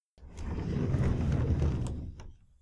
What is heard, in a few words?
Office Chair